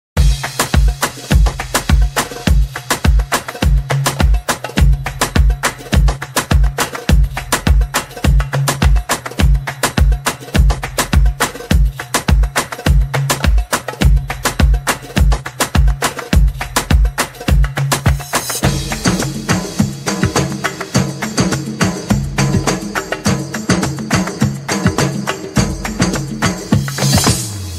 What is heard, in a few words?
arabic remix